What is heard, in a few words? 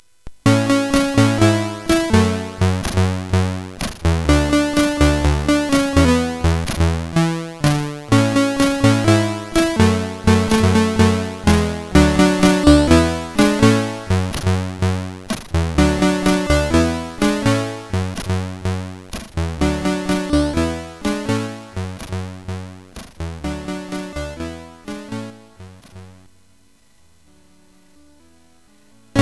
Commercial tune, sid, CSG8580R5